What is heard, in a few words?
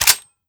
scar bolt release; cw20 extras